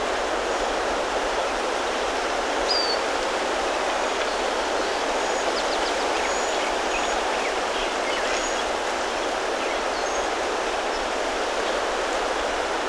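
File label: boathouse bird ambience; ambient; nature; Counter Strike Model Pack; CS:GO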